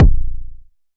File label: Jomox MBase, drum machine; Jomox MBase 01